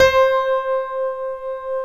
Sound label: DIG ACU PIANO; OMI - Universe of Sounds